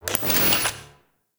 TV Turn On; Warframe; NineteenNinetyNine

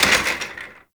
Office Chair Impact

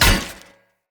Sphere Polearm Medium Impact Flesh Armour Hollow; Weapon Impacts; Player; Combat

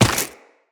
Run Dirt, Footsteps; Tenno

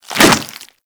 Infested Swing; Puss Ancient

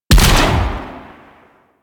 Blastor Pistol Launch; Warframe; Weapons; Corpus

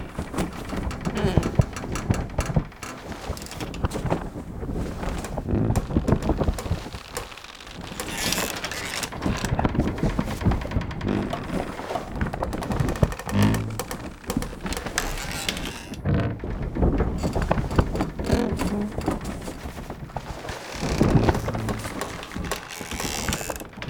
Tent Loop; Ambience; Props; Warframe; Duviri

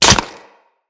Melee Impact Flesh; Kubrow

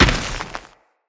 Moa Combined Step Dirt, Footsteps

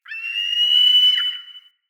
Mountain Forest Predatory Bird Far

